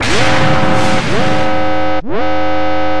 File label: Nuke Spell